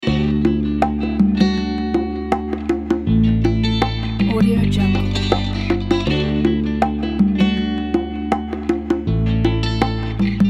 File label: positive acoustic